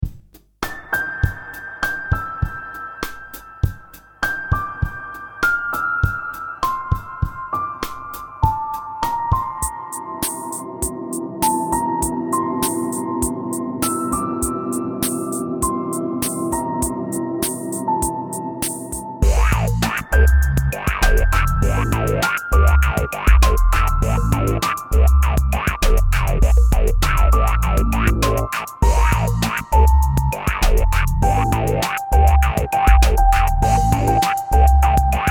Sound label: Music loop